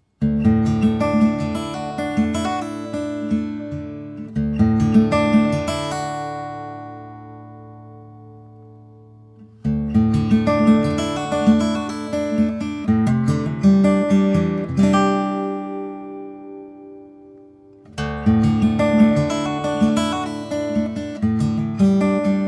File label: Guitar